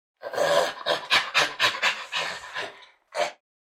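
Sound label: shimpanze golos, chimpanzee voice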